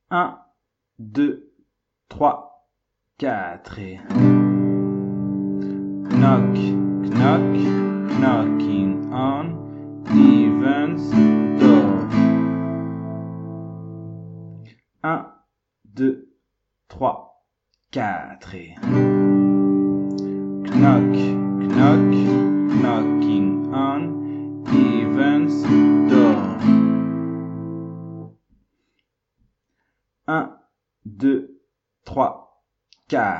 refrain sans chanter moyen

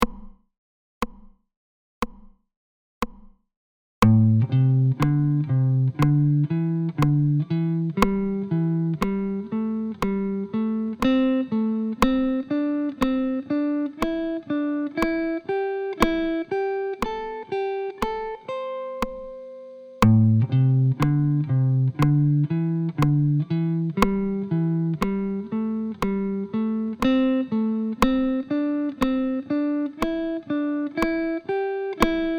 Vers l'aiguë; objectif solo 15 jours pour apprendre a improviser

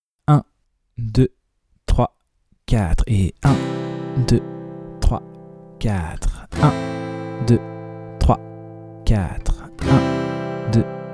moyen travailler la nouvelle grille d'accords; De 0 à vos premières suites d'accords

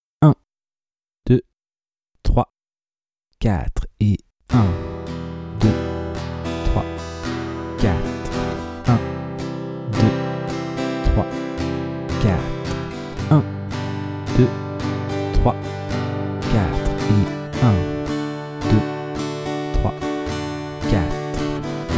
2 rapide La rythmique rapide avec la dernière grille

